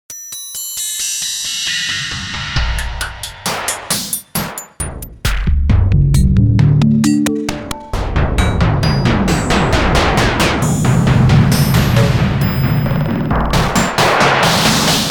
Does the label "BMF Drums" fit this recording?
Yes